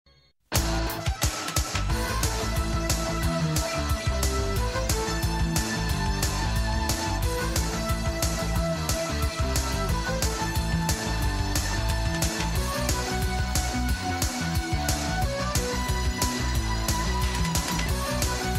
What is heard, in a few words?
instrumental; Legends of the Halcyon Era